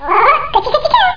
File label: bug tickles